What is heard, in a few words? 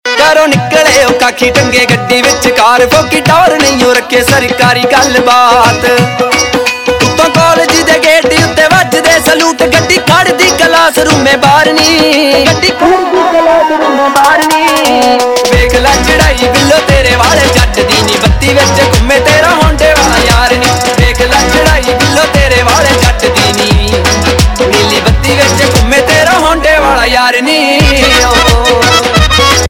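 Ringtone, Punjabi Pop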